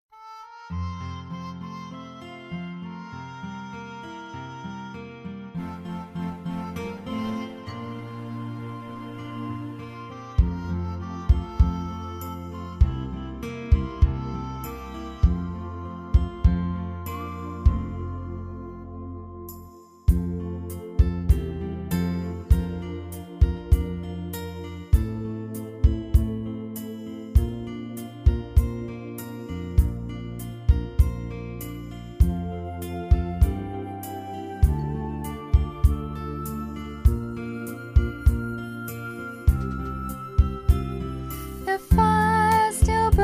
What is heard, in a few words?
soprano